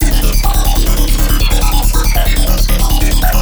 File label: Bass Psy Megapsy 140 D